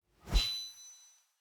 Sword Swing; soundscrate

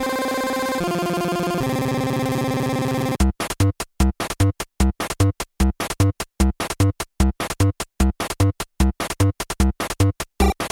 Chiptune Gears Out Looping; SoundsCrate

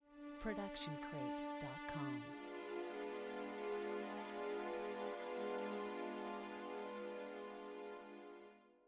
mysterious strings; soundscrate

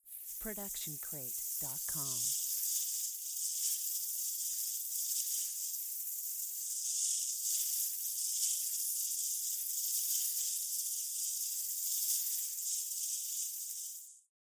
Magic Portal; SoundsCrate

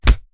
Fight Impact; SoundsCrate

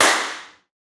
bullet impact rock

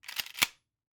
Shotgun Racking; SoundsCrate

